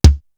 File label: dry kick; East West; Global Sounds